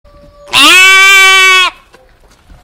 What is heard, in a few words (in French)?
L agneau bêle